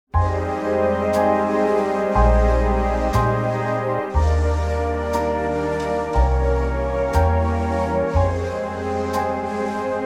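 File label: Eb orchestra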